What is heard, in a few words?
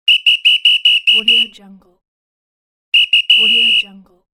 police or referee whistle